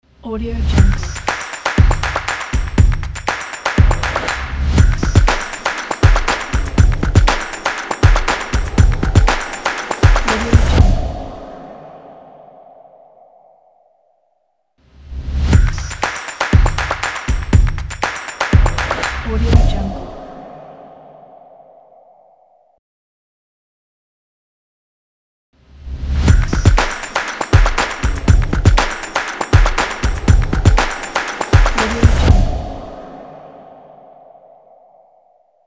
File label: percussion logo